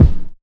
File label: clear kick drum single shot f sharp key